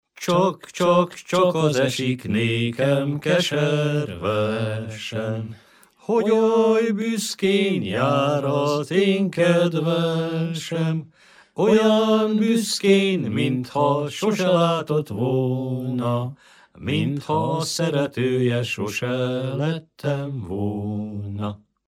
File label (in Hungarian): nepdal; csak csak csak az esik nekem